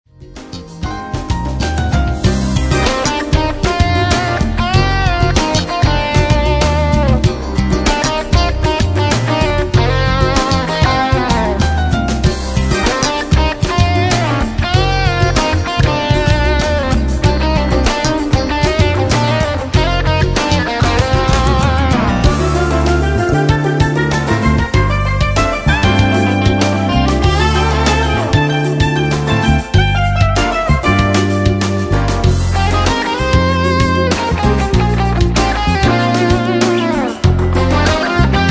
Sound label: funk